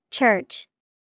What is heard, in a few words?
church